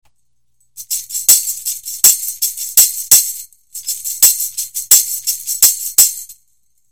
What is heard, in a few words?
tambrin; taiwan